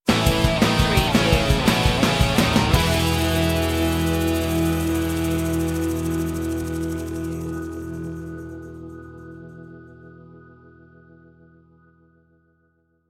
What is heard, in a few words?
young and carefree stinger